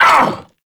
Vocal Set Pain; Warframe; Duviri; Dax

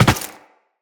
Land Glass, Footsteps; Characters; Tenno

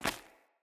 Run Stone, Footsteps; Characters; Mother

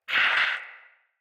Pain Knight Male; Mendicant

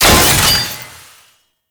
Incarnon Wrist Gun Clip Empty, Weapons; Warframe; Tenno

